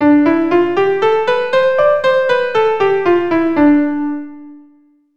C Major Dorian, keyboard

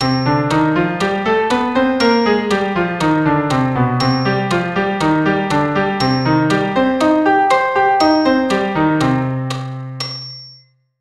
Cm melodic

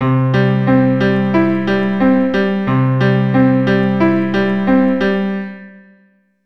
C Arp pattern

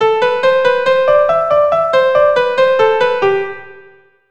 Eighths

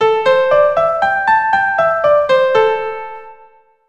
C Major Pent Mode

